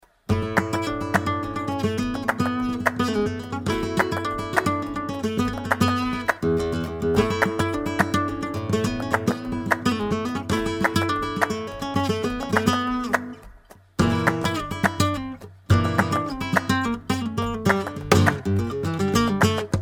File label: falseta buleria, flamenco; 2 19 – falseta buleria